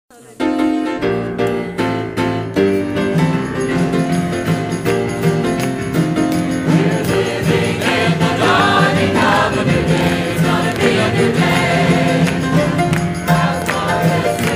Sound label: dawning of a new day choir